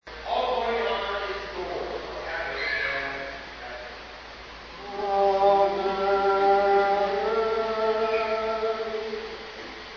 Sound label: Amen